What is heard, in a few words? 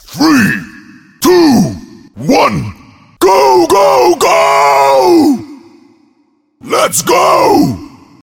3 2 1 Alarm; Broforce